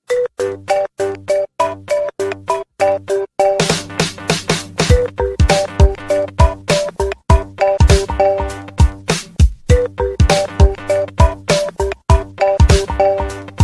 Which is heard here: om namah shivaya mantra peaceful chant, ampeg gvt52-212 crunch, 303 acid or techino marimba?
techino marimba